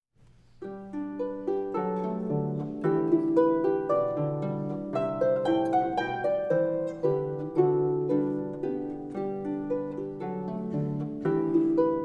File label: Harps